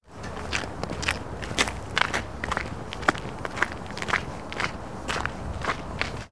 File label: Icy Walking